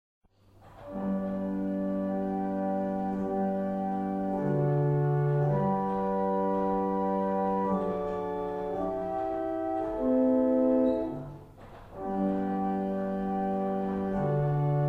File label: barrel organs; Sutton Beds; Hanover